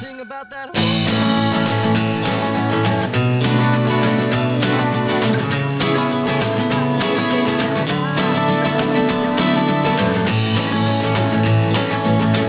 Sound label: instruments